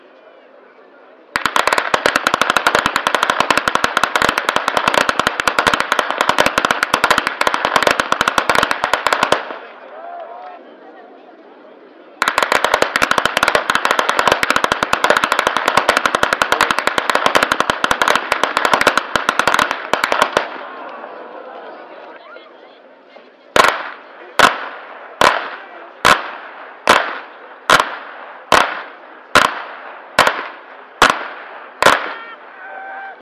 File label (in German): schnalzen, flachgau